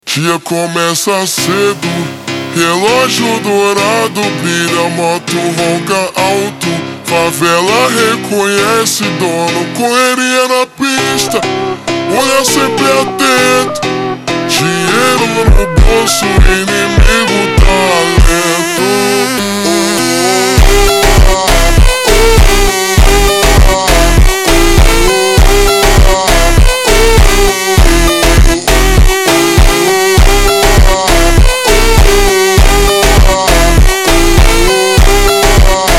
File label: Woah Ah (Ultra Slowed)